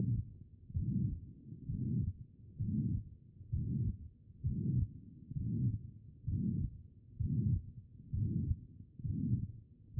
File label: HEART; B MODE